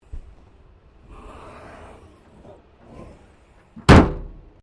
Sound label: Wreck Car Small Hatch Close; Wrecked Cars, impacts, crashes, scrapes; Objects